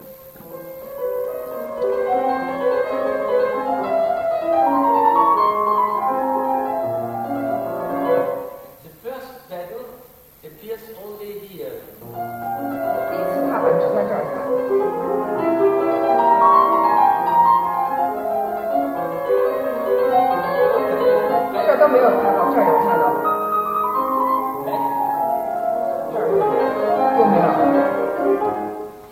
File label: chopin; 莫扎特和肖邦要弹得好，都要正确地运用踏板。我现在慢弹，解释一下